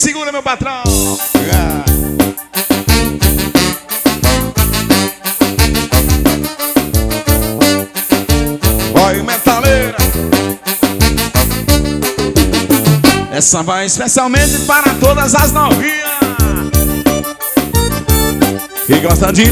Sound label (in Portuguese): forro teclados